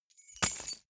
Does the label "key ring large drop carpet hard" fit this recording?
Yes